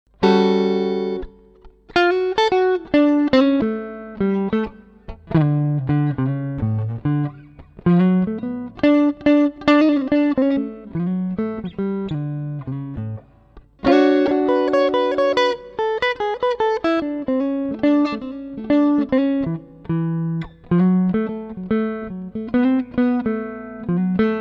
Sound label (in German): Framus Vintage Series 551 Studio akk. bluesy